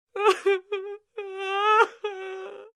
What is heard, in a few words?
crying, Human Female; Voice